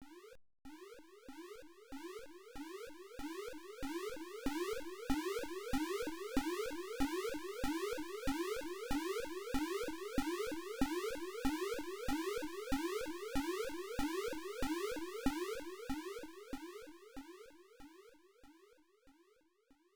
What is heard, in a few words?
Police Siren; anniversary natsuyasumi no omoide; pc 98